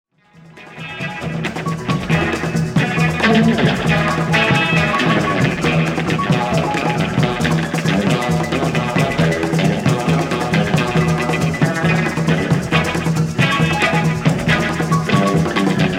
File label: Guitar